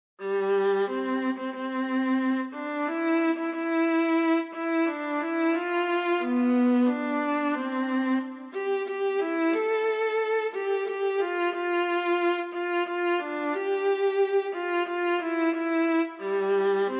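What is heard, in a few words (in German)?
solo O Tannenbaum Bratsche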